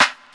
natural snare sample c key, Loudest frequency: 2229Hz